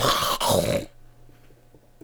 zombie die

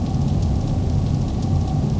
loop, m249; tfa cso; m249p

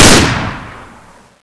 fire, weapons; tfa cso

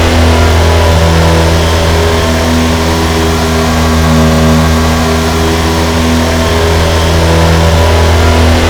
4 banger second

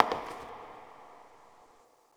succubus step; queen girl

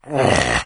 melee; doom3; morgue

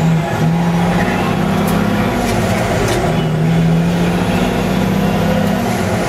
first, vehicles cars; kamaz; lwcars

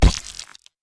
alien flesh

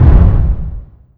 mancubus step; doom3

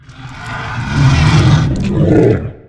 hail

